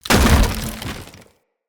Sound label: Crate Wood Medium Destroy